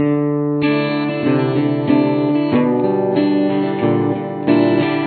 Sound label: chorus, guitar